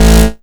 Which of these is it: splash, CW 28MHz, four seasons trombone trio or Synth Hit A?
Synth Hit A